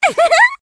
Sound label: giggle; amy rose